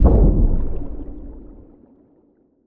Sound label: giant Reel Stop; netent